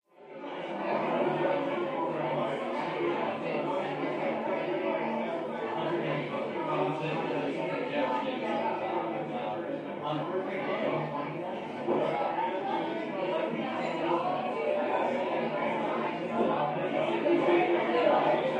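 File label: Party Busy Adult Walla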